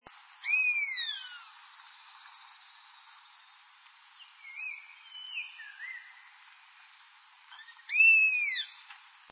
baza coucou, chant

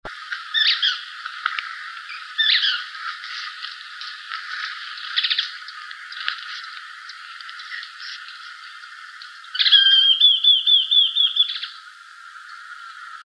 tyran a queue rousse

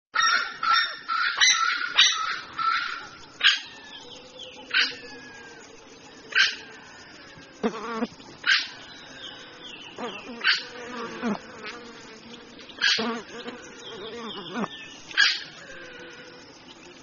francolin huppe, chant

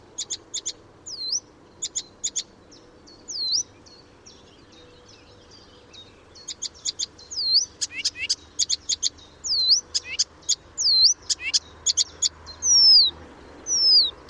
rousserolle des buissons; chant

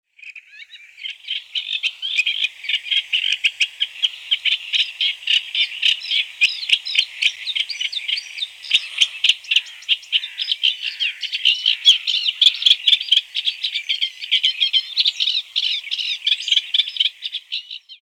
rousserolle effarvatte

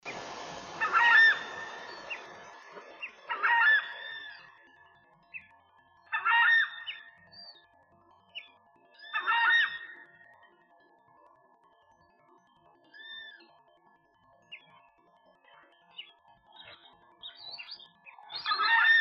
kamichi a collier, chant

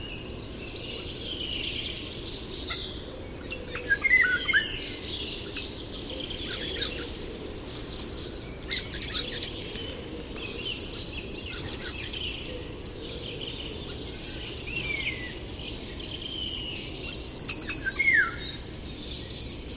etourneau sansonnet; chant

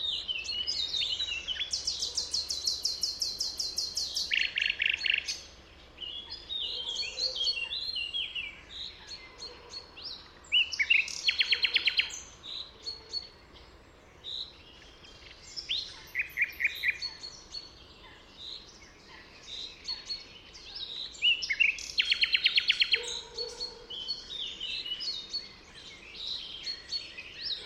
rossignol philomele; chant

